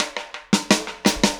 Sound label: Static Beat Intro; Sonic Foundry (Sony Creative Software); Indie Rock Anthems; Session 13 - Static